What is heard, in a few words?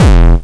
bombo, kicks, Hardcore